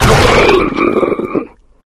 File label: combat body destroyed; flood